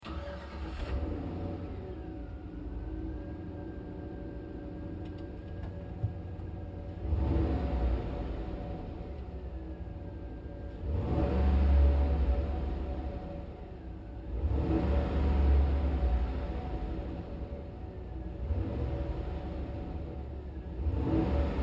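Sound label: Truck GMC Savana Interior Start Revs Off Lite; Trucks; 2010 GMC Savana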